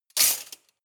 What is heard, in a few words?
cutlery large multiple drawer place